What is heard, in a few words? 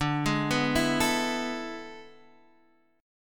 A Major 7th Suspended 2nd Suspended 4th